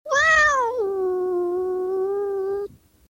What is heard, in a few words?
cute kitten; animals